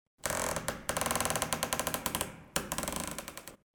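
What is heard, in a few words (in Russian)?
звук двери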